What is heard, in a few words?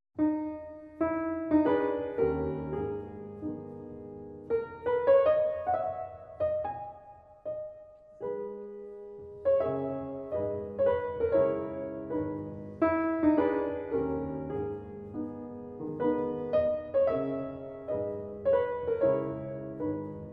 Mazurka in G sharp minor; Op 33 No 1